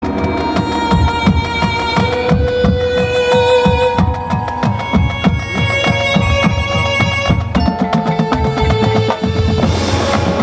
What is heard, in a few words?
Band Heartbeat Time Slow Down